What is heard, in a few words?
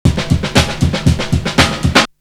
Beat; AMG